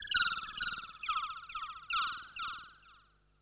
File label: ethno 125